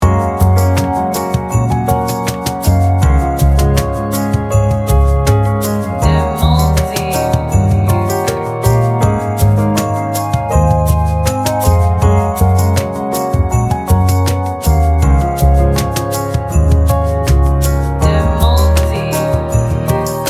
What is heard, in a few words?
instrumental pop; Lagu ini seperti jalan santai di pagi hari, ketika udara masih segar dan dunia baru saja bangun dari tidurnya. Musiknya ringan